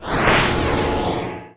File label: transform; ceroba phase 1; deltarune yellow translation